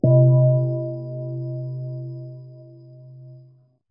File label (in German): Hausgong